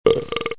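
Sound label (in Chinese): 吉他; PPT音效; 饱嗝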